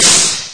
somen lower far; HeavenStudioPlus; rhythmSomen

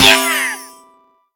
fish; HeavenStudioPlus; kitties

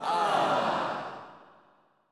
disappointed, audience; HeavenStudioPlus

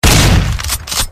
shotgun shoot; sm64coopdx; gun-mod

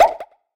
transform; HeavenStudioPlus; tram And Pauline

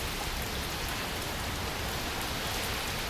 rain Loop; HeavenStudioPlus; sneaky Spirits

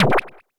long Appear; HeavenStudioPlus; rhythm Tweezers

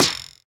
hit Forth Farther; HeavenStudioPlus; Air Rally

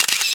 shutter; Freeze Frame

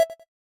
result message; HeavenStudioPlus; rankings

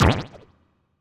roll L; HeavenStudioPlus; flipperFlop

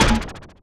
stop; HeavenStudioPlus; slotMonster

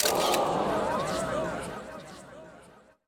camera; Heaven Studio Plus; ringside

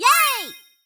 yay; Cheer Readers; Solo

